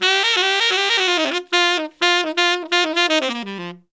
SAX SOLO; Zero-G; Phantom Horns

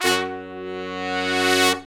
BRS LONG, R&R Horns, Sect; Roland L-CDX-03

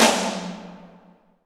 toms, cinematic impact, drum

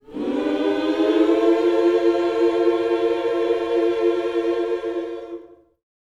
MINOR, MIN.SCOOPS, Vocal Planet, Gospel

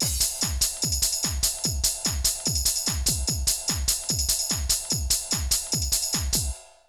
Essential beats; FM 57 - The Ultimate Sample Collection (1997); Higher Sauce 1,2,3(147)

